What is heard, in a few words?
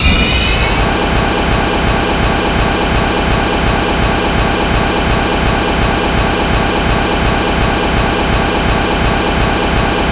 turbo